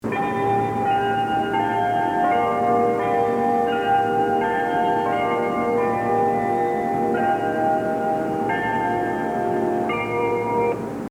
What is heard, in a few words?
melody; 1・2番線発車メロディ; 大崎駅; 永楽電機製のメロディ; jreast yamanote